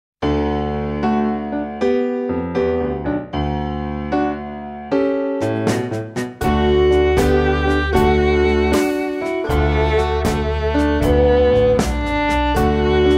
Cattle ranch blues

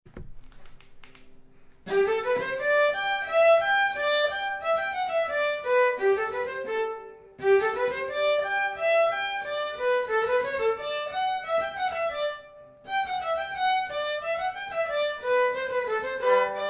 gavotte

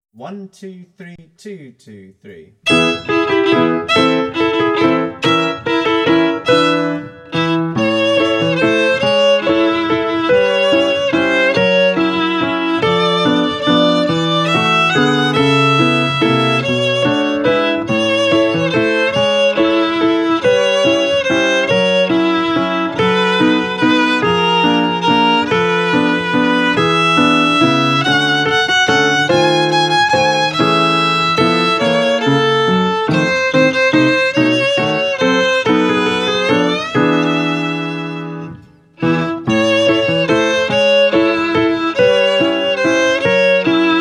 Violin

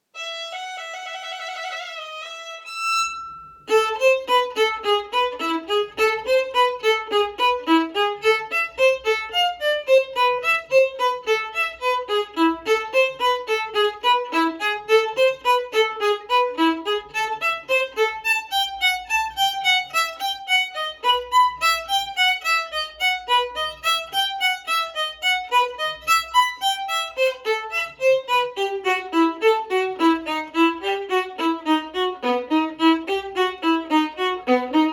Violin Etude; Charles Dancla; no. 7 Op. 84

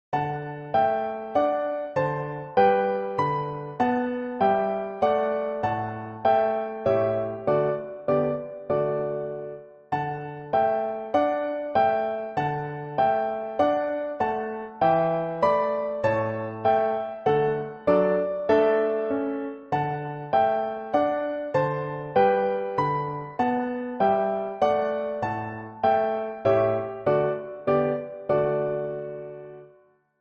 Accompaniment Track

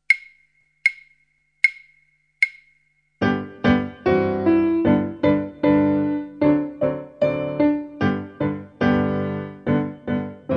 piano

